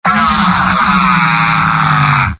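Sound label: demoman pain critical death; mvm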